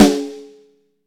smooth bottom end steel snare drum sample c sharp key